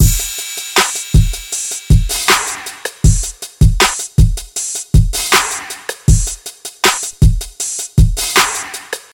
79 bpm modern drum groove d sharp key, Free drum beat - kick tuned to the D# note.